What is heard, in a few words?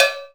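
COWBELL, DRUM KITS; InVision Interactive; 40 Oz Phat Slammin Hip Hop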